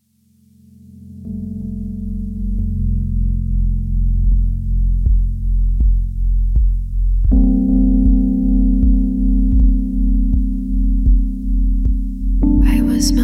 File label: residual vocal test; not made for this